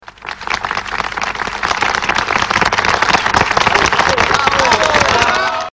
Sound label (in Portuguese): aplausos de aniversario